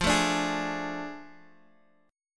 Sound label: A Major 7th Suspended 4th Sharp 5th, chord